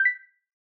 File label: dodoo noclick